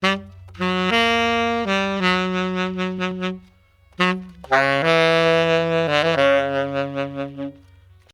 tenor sax riff; Music; DailySounds

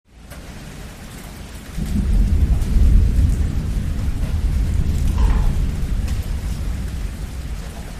distant thunder; Horror

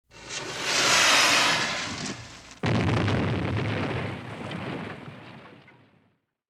icbm launch w explosion; SFX; DailySounds

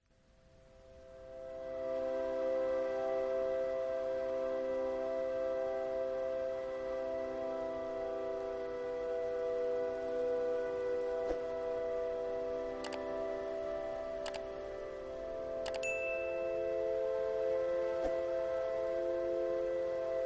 piano ambient; Music

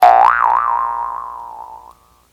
jawharp wacky boing sound; Music; DailySounds

